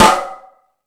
Fusion Stix, E-MU Pro-Cussion; drum machine

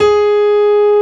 PIANO G#3; Analogon

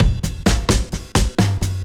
Beat Style A 130, breaks; 130bpm

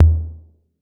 808 SOFT; Wizoo - Platinum 24 Electronic

